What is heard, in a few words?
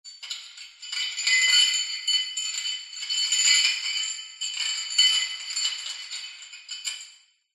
horror chain shackles movement dungeon prison reverberant